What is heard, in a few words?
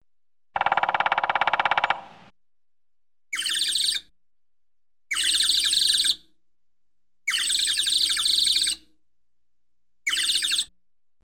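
bird woodpecker knocking with beak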